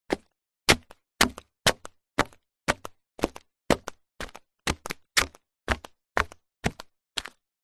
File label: foley footsteps walking on wood bridge flip flops